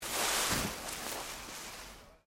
LEAVES MOVE MINOR, Rustling

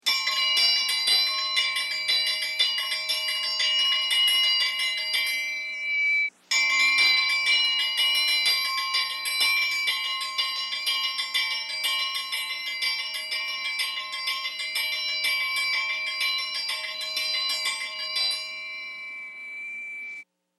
Antique Electric School Bell Slow; Bell Sound Effects

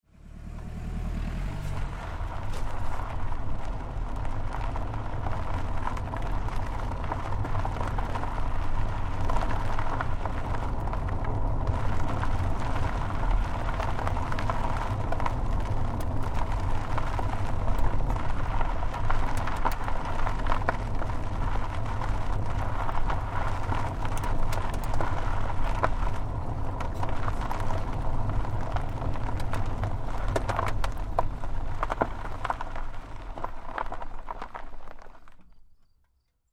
Car Tire Onboard Gravel Dirt Roll Slow Long; Cars; BigRoomSound

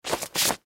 Clothes Hand Brush Jeans Wipe; Brushing; Foley; Blastwave

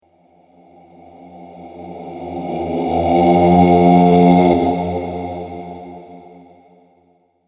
Haunting Halloween Spirit, ghost

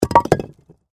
Impact Rock Drop, Heavy, On, Rocks; Materials

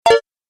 Message Alert, beep

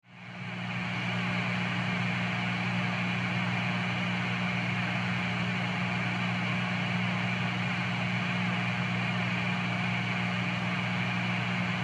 Musical Guitar Amp Hum; Guitars

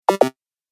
Retro Gaming Sound; Video Game Sound Effects

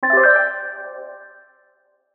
Musical Accent, Musical Accent Keys; Musical Accent Keys Twinkle Wah Reverb Fast; Keyboard Sound Effects

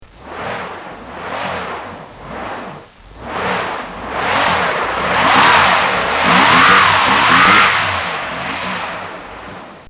Car Stuck In The Snow; Snow (Sound Effects:Weather)

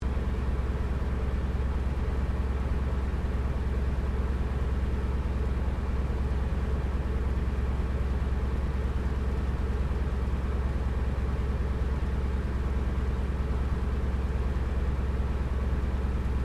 AIRCRAFT CA-13 BOOMERANG SHUTDOWN INTERIOR; Airplanes; Vehicles

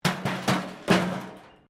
metal rubbish bin smash concrete

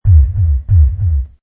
Underground Thumping Or Dark Pulse; Deep, distant thump sound, distant knocking, fantasy pulse; Scary Soundscape Sound Effects; Lynne Music

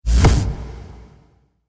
Cinematic Hit, Trailer Sound Effects

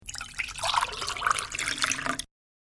Filling Object With Water; Pouring Sound Effects

